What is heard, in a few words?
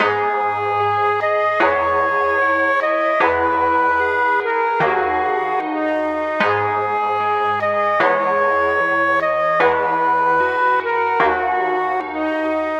Melody Loop 150 BPM G# Min; Cymatics - Astro Gazing